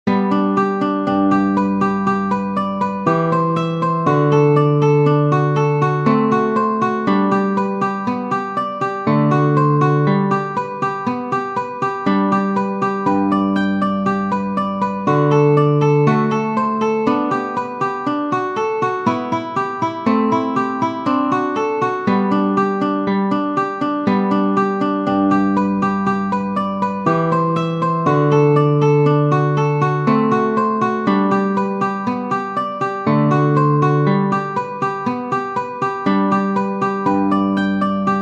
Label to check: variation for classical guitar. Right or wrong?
Right